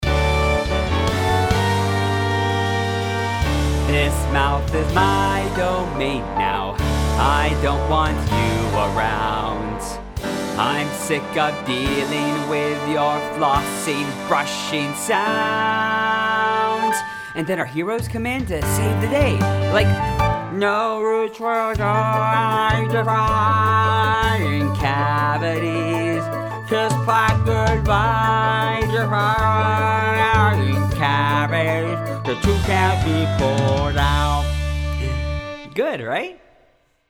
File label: VOCAL; Scene 8